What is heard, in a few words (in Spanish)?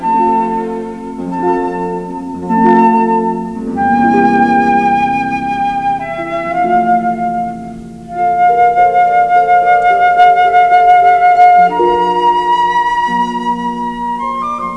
Flauta